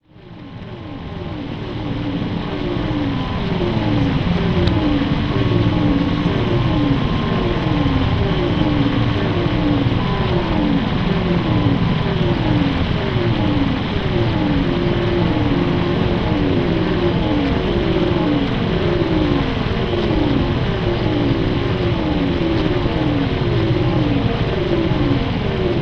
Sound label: STRANGE ATTRACTOR, DEEP ATMOSPHERICS; Sonic Foundry (Sony Creative Software); Vir Unis Infusion